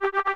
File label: Oneiric Blend Trumpet G3